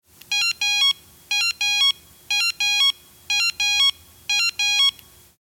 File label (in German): Pieper